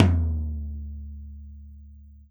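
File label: 14 tom, Drums&Percussion; E-MU Producer Series Vol. 7 – Old World Instruments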